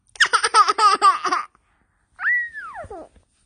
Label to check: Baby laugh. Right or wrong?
Right